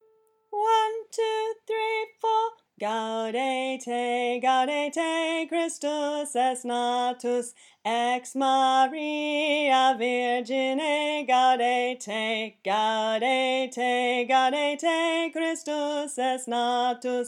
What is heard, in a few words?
tenor; Gaudete